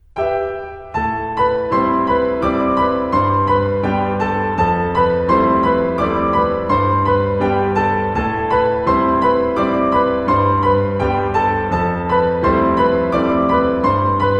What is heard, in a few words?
piano